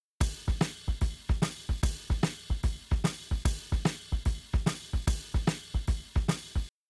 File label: Drums, shuffle drums; WDR2